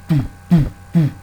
Bassdrum; gebeatboxten (d.h. mit menschlicher Stimme erzeugten) Bass Drum

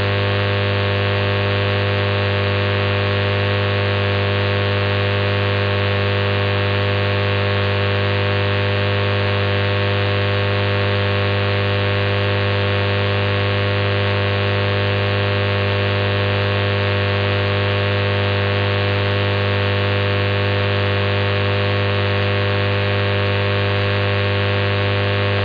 mw 801 khz

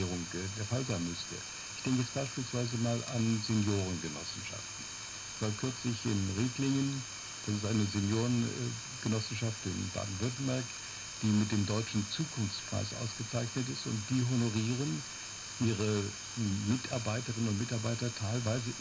radio

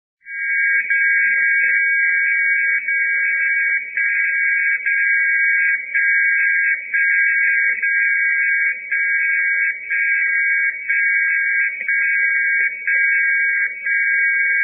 DCF Audio

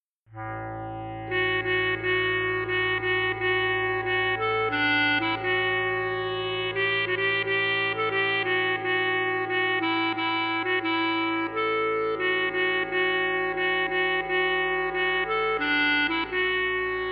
Jingle Bells Midi; TiMidity

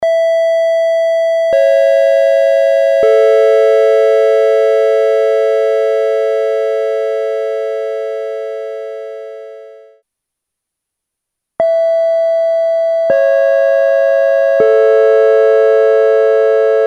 gong

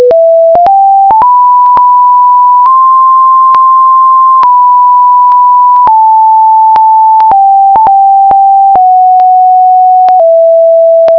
moldau

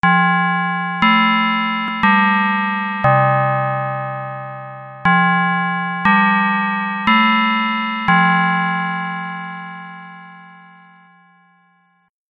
fm chime

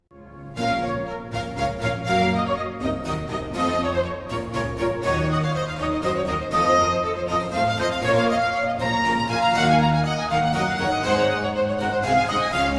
Alla Hornpipe

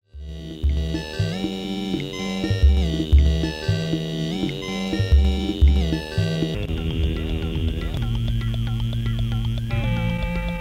Dancing robots

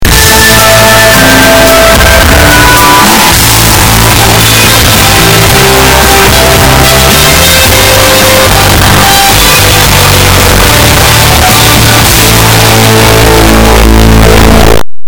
8Bit Mono; Krombacher

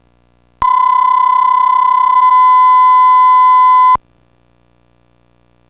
psk signal

